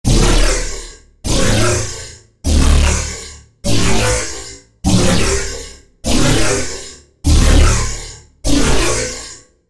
SFX - Teleport; Routed Sound; Vital Expansion - Quantum Enforcer Vol.1

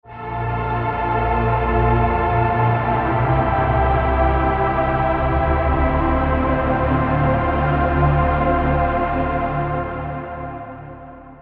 Pad; Magenta Life; Routed Sound; Vital Expansion - Reality Maker Vol.1

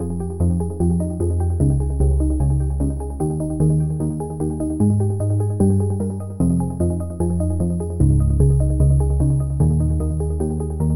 Key, Sylenth1; Key - Enchanted Forest; Routed Sound; Sylenth1 Expansion - Story Walker Vol.1

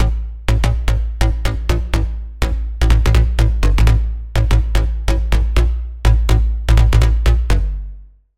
Bass; Routed Sound; Sylenth1 Expansion - Noble Gangster Vol.1

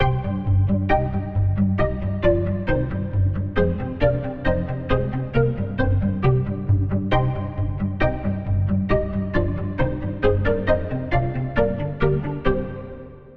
Key

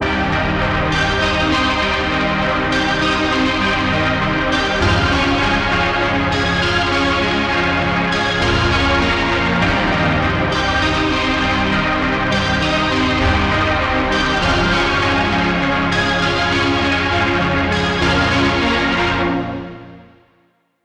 Key, Spire; Front Gate; Routed Sound; Spire Expansion; Street Vanquisher Vol.1

